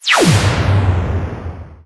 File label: Plasma Lazer